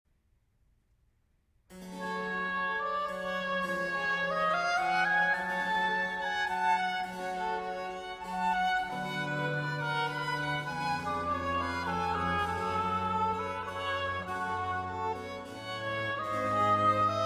Concerto For Oboe, Violin, Strings and Continuo in B Flat Major; RV 548; Vivaldi